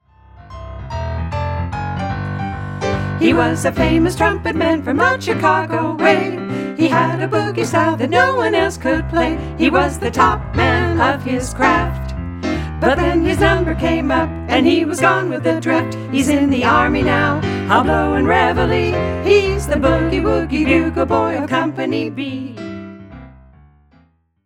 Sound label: Bugle Boy